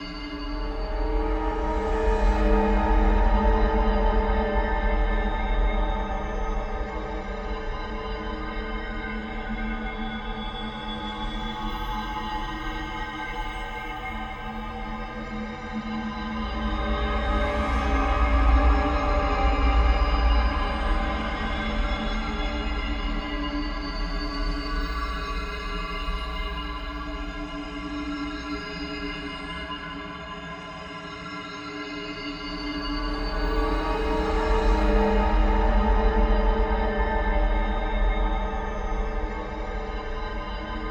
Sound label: Danger Swerves; Eon Altar